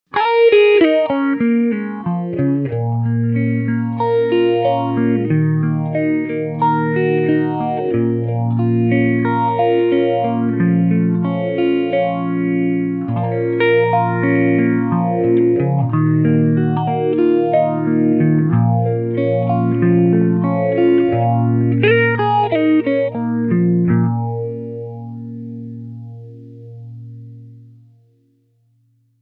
phaser; mobius

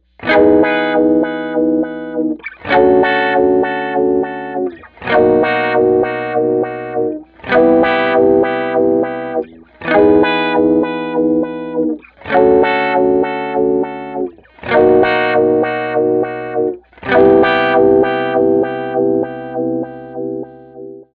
filter square funk; mobius

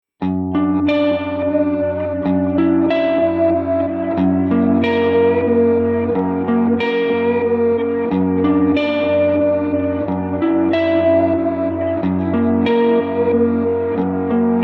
reverse

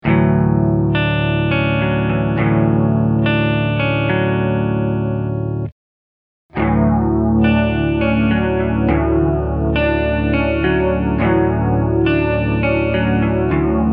chorus; Mobius

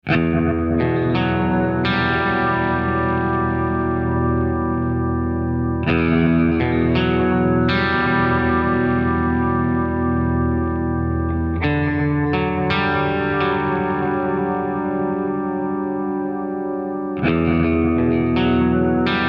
Old Tape Echoes; Strymon Compadre → Strymon Iridium → Strymon El Capistan

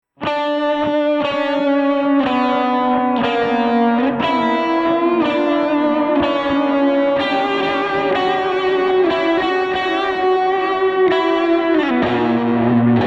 reverse

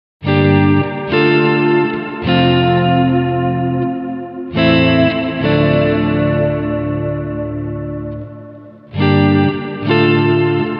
swell; BigSky; reverb; Only the Ones

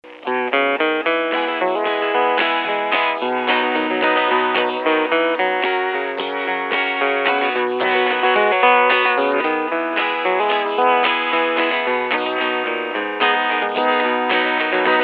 destroyer; mobius

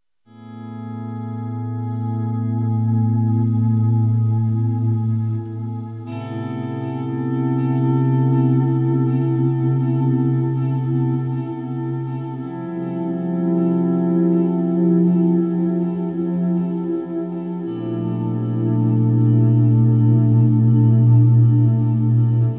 Warm Whalebone; Strymon Compadre → Strymon Iridium → Strymon DIG

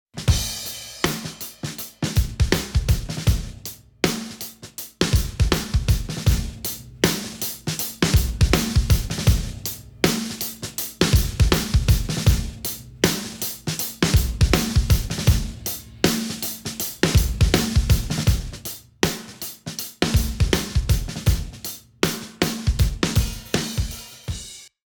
On Drum Crush

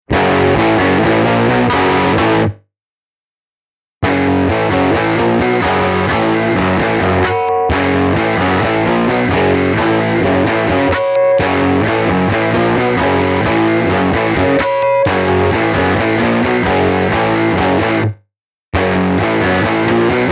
quadrature, mobius

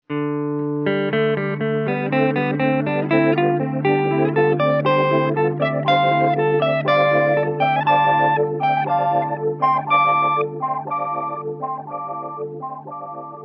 Max Delay Time

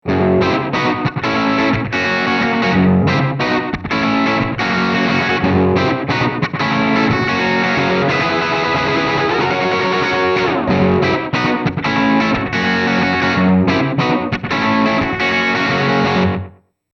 tape, timeline, dtape

